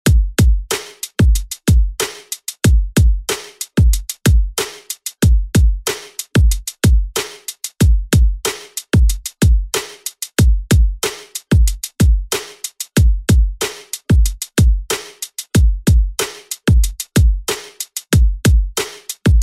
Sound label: Party Hype Redrum; Deejay Hit